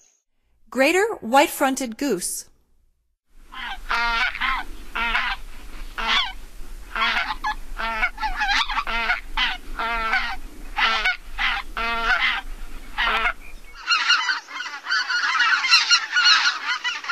Greater White fronted Goose; North America; Stokes

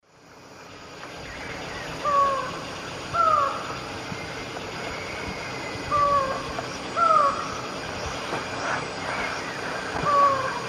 Micrastur gilvicollis, 2-noted song; S. America; Eastern Lowlands

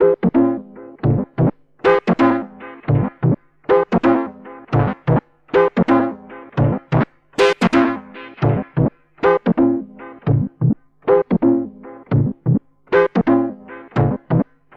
Synth, Techno

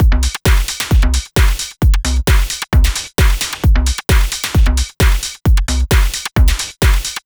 Complete Loop, Dance Beats

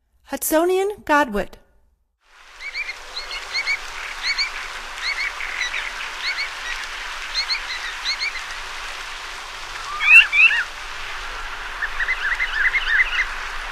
Hudsonian Godwit; North America; Stokes

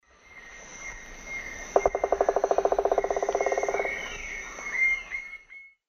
Celeus torquatus, drum; S. America; Eastern Lowlands

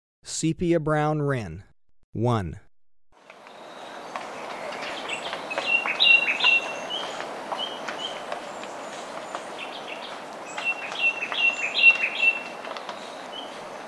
Sepia-brown Wren, Birds of Ecuador; S. America

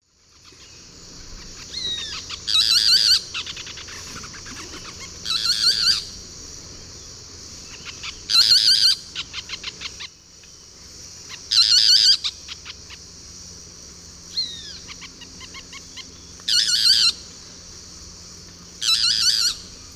Megarynchus pitangua, song; S. America; Eastern Lowlands

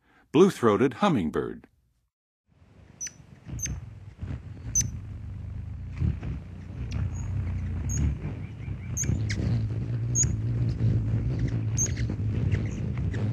Blue-throated Hummingbird, Western USA Songbirds; North America

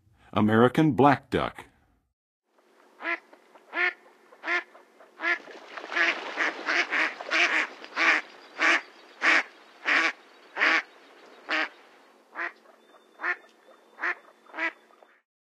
American Black Duck; North America; Western USA; Western USA Songbirds

